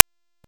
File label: Sniper Drone Deploy; Warframe; Lasrian